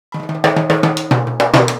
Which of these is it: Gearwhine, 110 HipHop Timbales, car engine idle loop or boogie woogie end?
110 HipHop Timbales